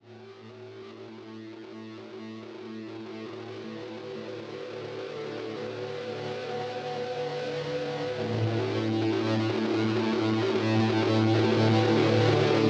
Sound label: drone, synthesizer; sinmad-synth